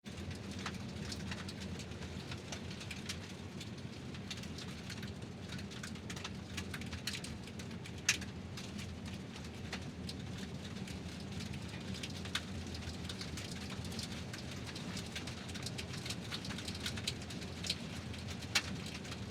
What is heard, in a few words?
driving on gravel